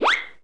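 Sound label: whiz fast, cartoon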